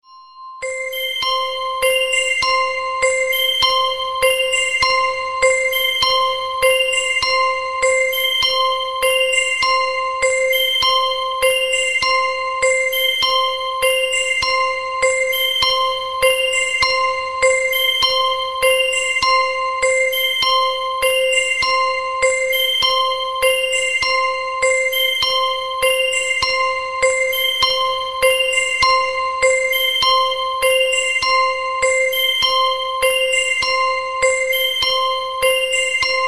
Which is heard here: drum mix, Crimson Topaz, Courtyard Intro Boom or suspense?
suspense